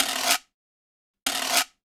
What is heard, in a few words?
Quiro, Latin Percussion; Sonic Foundry (Sony Creative Software)